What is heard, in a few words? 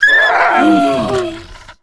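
kinrath hound dead; Star Wars Knights Of The Old Republic I; XBOX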